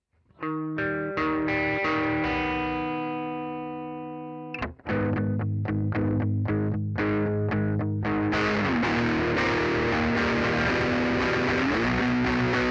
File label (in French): guitare